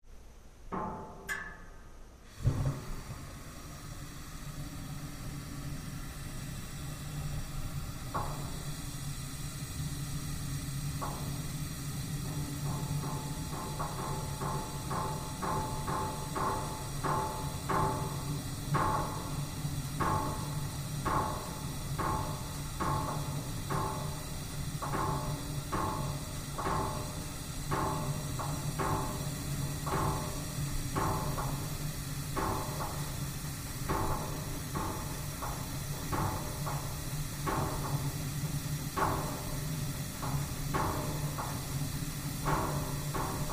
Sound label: Metal Heater Large, air